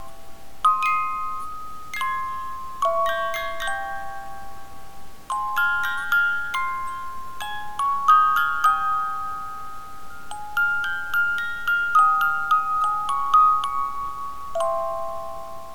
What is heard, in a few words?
Clair De Lune, MusicBox